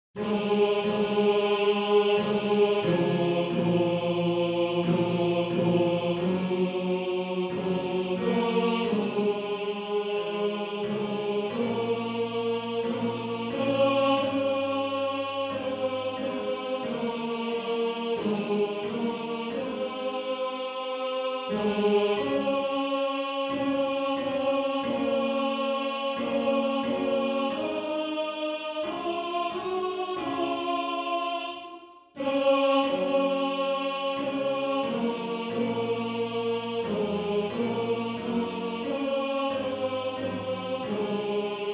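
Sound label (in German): Tenor 2, Männerchor; Morgenrot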